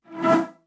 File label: Flash